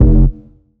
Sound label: Bass F, Bass Synth